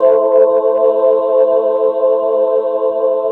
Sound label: Choir, Keys, Pads